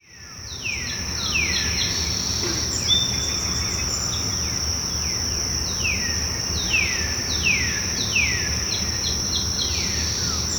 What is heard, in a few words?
Forest